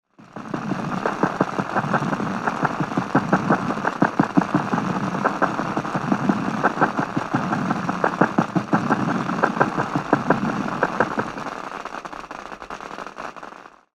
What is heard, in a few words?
Mangled Delay; AudioThing Hainbach Bundle; Wires